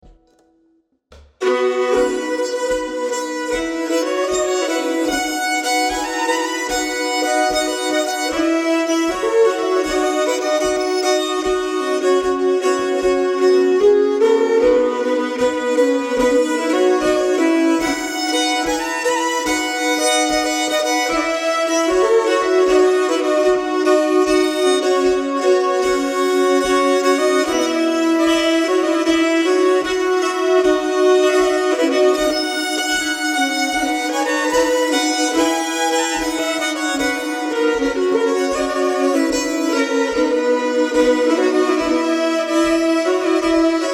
Brudmarsch, bagpipe fiddler; efter Per Erik Olsson Hidinge

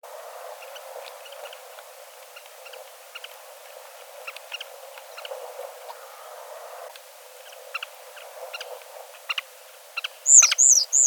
Svartvingad vadarsvala

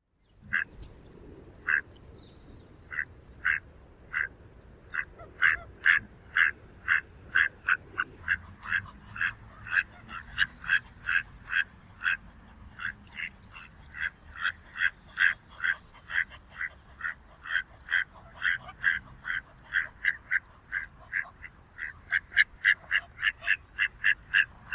Gräsand

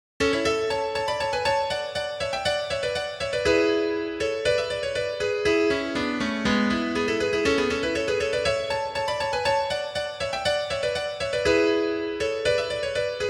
Trettondagsmarschen m stamma

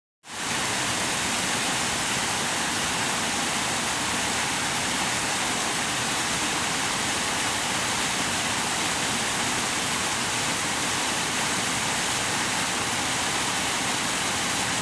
Qvarnfallet Bjärsjön

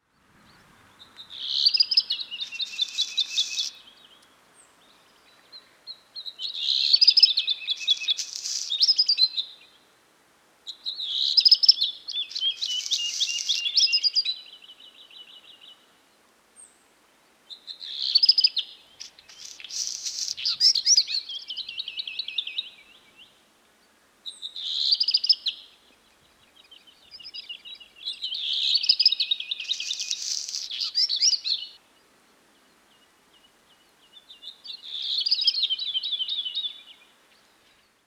Svart-rödstjärt

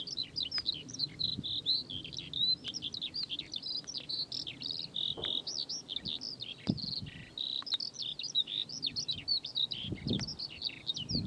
Sånglärka

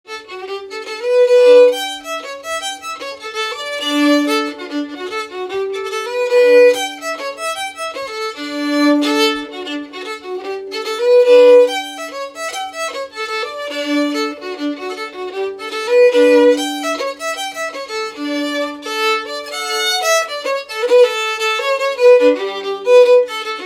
Polska; efter Sjöberg Kossbo Granbergasdal

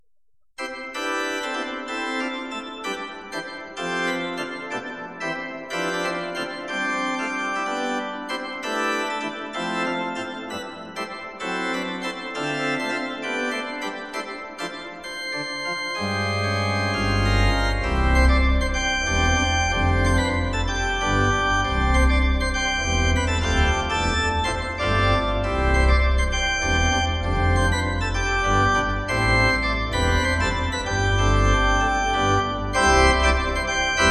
orgel

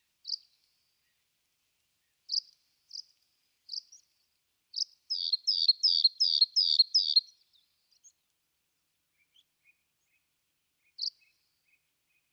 Blåhake

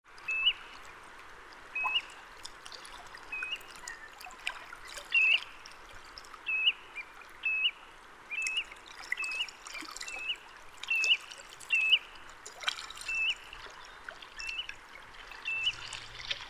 Större strandpipare

